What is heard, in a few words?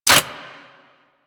Rifle Mech; Warframe; Weapons; Infested; Inf Quanta